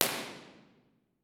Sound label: Dodec IR, Teatro Municipale Piacenza; Dodec IR B&K2250